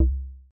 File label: bump